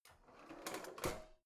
old drawer creaky out

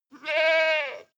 goat baa high male

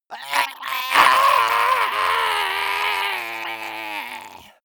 monster death scream high

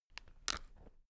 spectacles case close soft

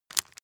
bone break small

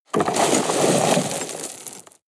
drop rock debris hard

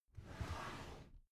drawer cabinet carton open soft halfway

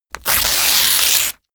tear

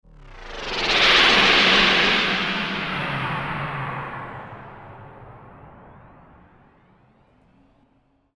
beam down

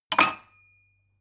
glass shelve hard

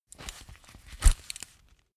leather belt open medium

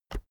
keyboard external enter push

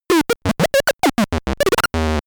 talking arcade

